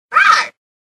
Rawr

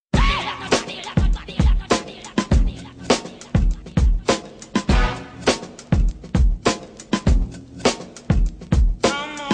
Beat

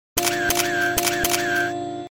Camera Snap

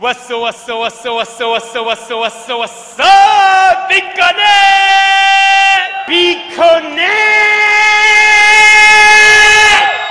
scream; bitconnect

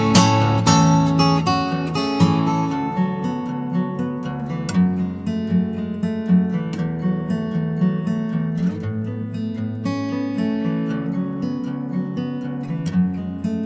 Acoustic

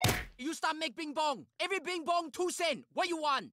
Bing Bong

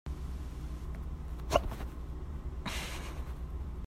Hiccup - Cute

